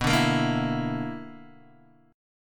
B-Minor Major 7th Double Flat 5th B down Guitar DADGAD